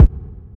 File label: reverb kick drum sample c key